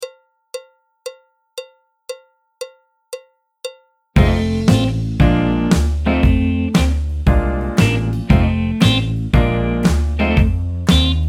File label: clarinet, flute, tenor; Jazz Syllabus Level 3